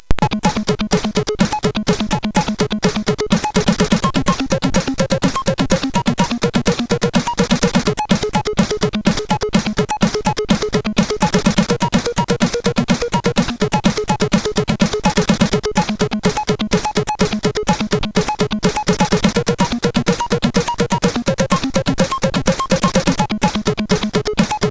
sid MOS6581R4, GAMES; Dark Caves

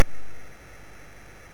sid MOS6581R2, GAMES; Face Off

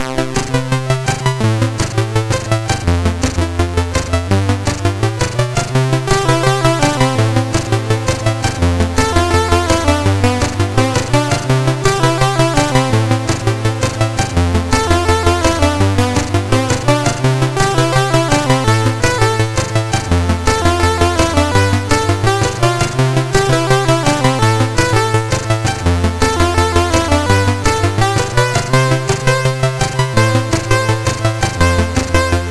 Solomons Key, MOS6581R4

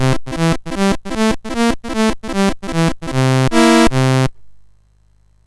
MOS6581R2, GAMES; Sammy Lightfoot

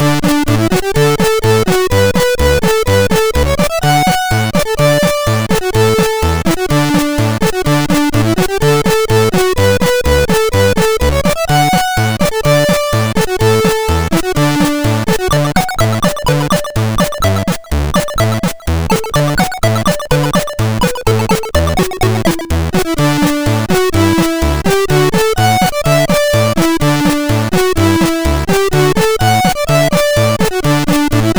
sid MOS6581R2; Cowboy Duel

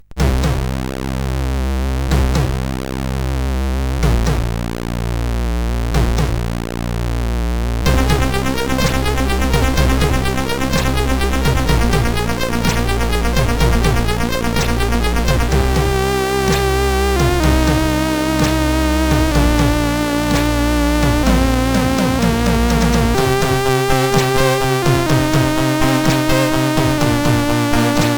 tune, sid CSG 8580 R5

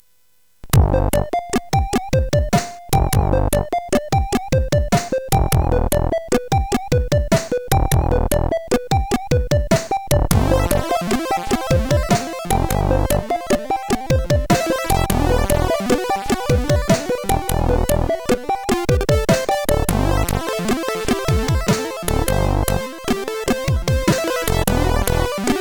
Boogie Funk, CSG8580R5; hvsc

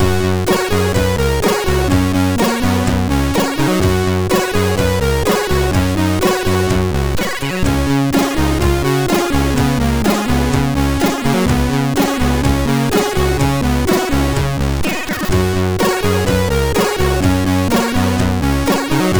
Ultimate Intro Studio advert, MOS6581R2

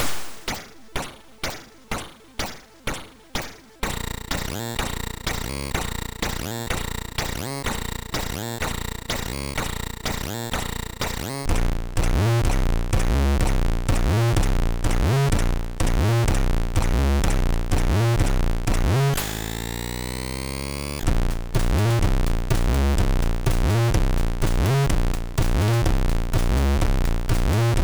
sid, MOS6581; Luftrauserz

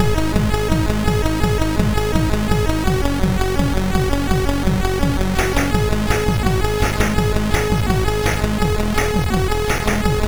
sid MOS6581R3; Fighting Soccer

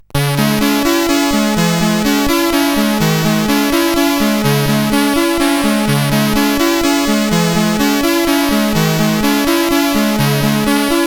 Hackpack IV menu, CSG8580R5

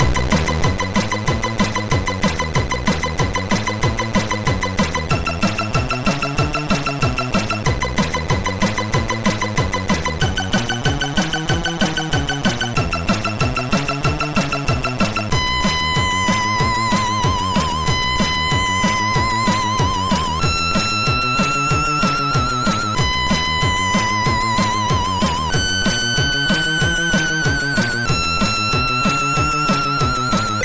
Boogie, MOS6581R2

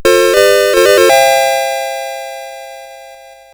Buggy Boy, CSG8580R5

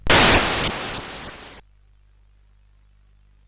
Frantic, CSG8580R5

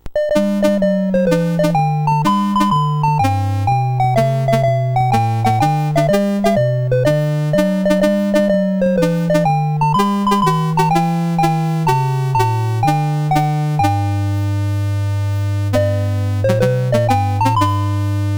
Battle Hymn, BASIC

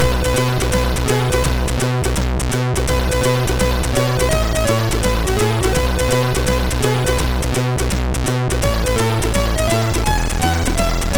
Reggae Example sid MOS6581R3

